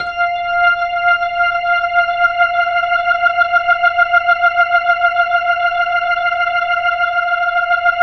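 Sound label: 4F R, Slow Fast Hammond, Organs; E-MU Producer Series Vol. 5 – 3-D Audio Collection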